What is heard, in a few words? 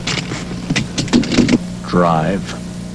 drive; Crow 1 Workprint-tape